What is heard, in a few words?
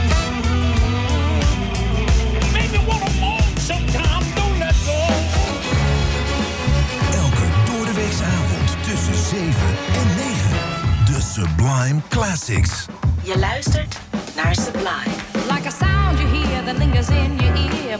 Sweep